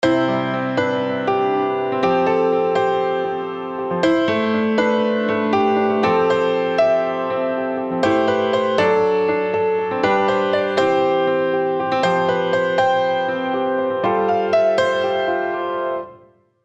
Upright B

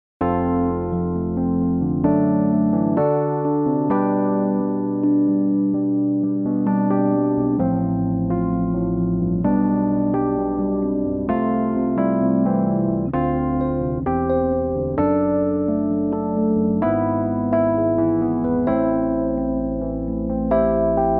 Rhodes Chorus

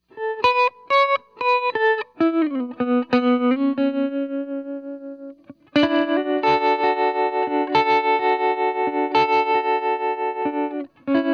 Electric Guitar Dry

